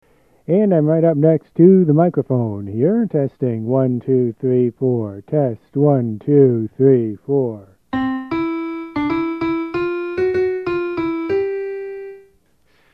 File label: CTK-720 keyboard test